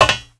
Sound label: player metal; svencoop